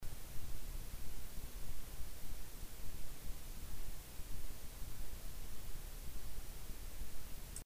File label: USB Mic Self Noise; Maono